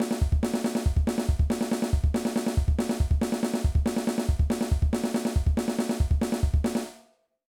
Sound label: Punk Drum Fill